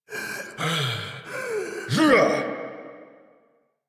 Exit Breaths; Warframe; Veilbreaker; Kahl

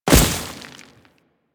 Step Veg, footsteps; Warframe; Grineer

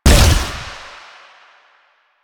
Fire Shot, Weapons; Duviri; Incarnon Adapters; Incarnon Dera Vandal

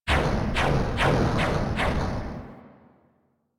Background Explosions; Warframe; Caliber Chicks minigame; environment

